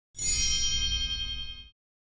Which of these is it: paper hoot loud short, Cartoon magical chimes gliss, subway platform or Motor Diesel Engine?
Cartoon magical chimes gliss